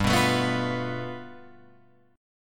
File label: G 9th, Guitar Open F; strummed